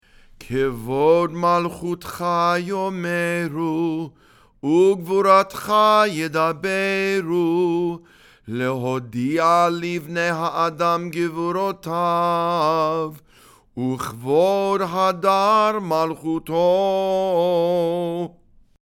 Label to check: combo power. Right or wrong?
Wrong